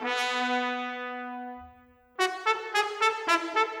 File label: Trumpet, Orchestral; Sonic Foundry (Sony Creative Software); Orchestral VOL-2; Modern 10